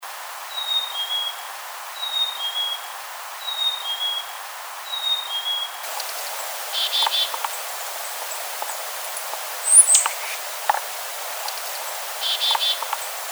Black-capped Chickadee

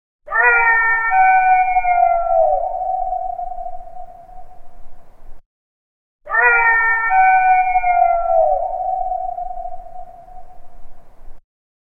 Howl; Colorado

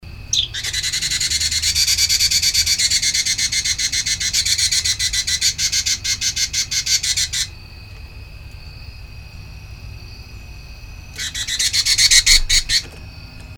House Wren

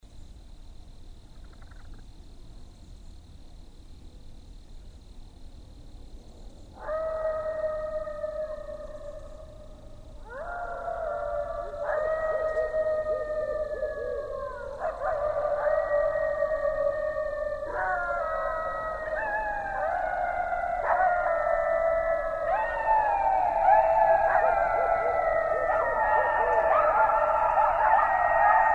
Coyotes; Coyotes (Canis latrans)

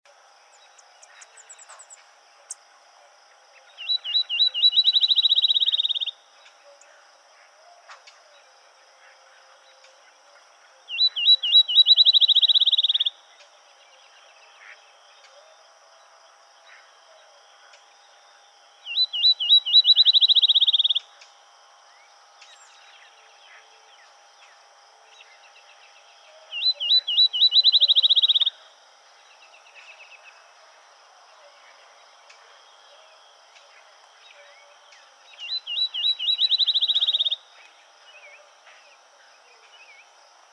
Field Sparrow

